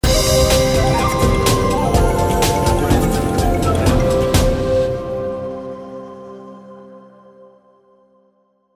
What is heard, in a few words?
mega win; Shamans Dream 2